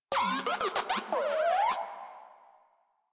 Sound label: Moa Compassionate Vocal Greeting; Warframe; Pets